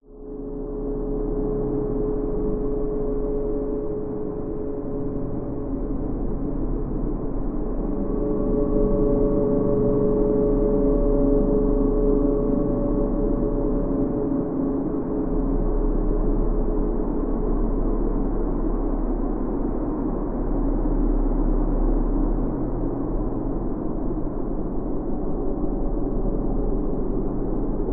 science fiction atmosphere cold dark sinister wind lfe drone